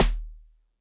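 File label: Xylo Snare DX100 D sharp 1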